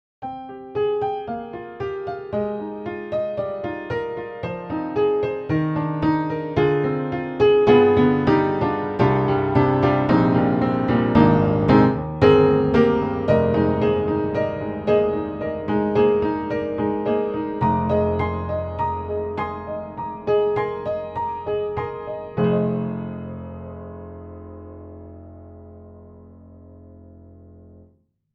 Upright Pianos Vintage Upright Vintage Parlor Upright Piano; Synthogy; Ivory II